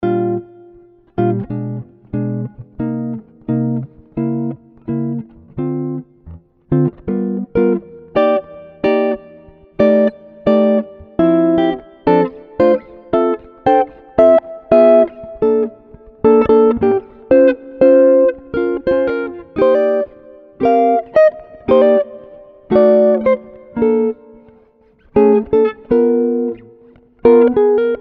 Jazztastic

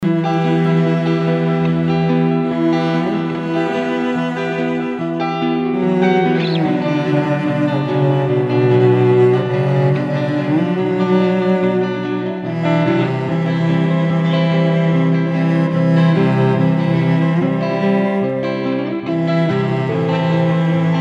Smooth Cello and Guitar

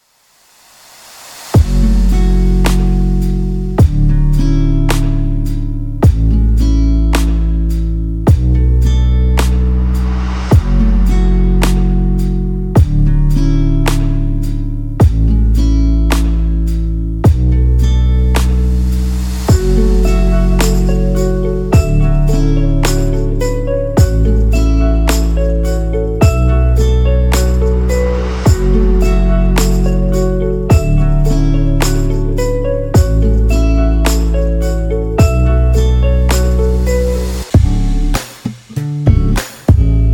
Instrumental Lounge

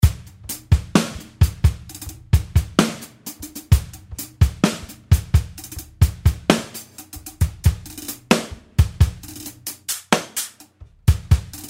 Acoustic Trap Beats

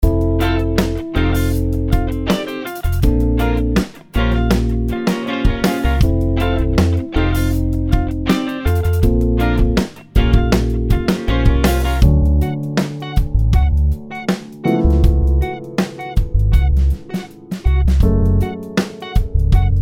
Soul Funk Music

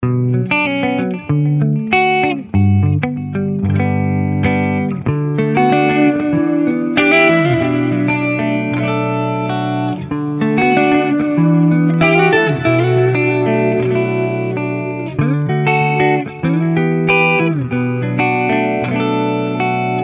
Pop Guitars

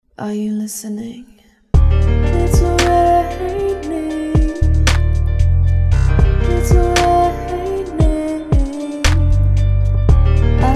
Vocal Hooks

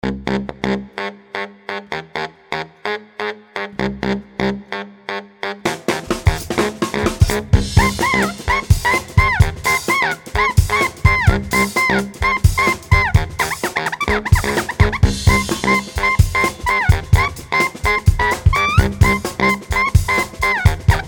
Brasshouse Madness

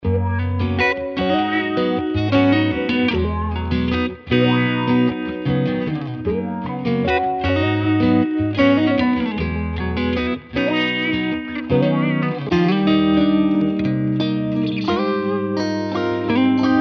RB Guitars

